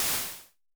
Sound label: Crash Misty, Vermona Mono15 Drums